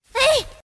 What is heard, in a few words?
longjump; sven; player; Miku verano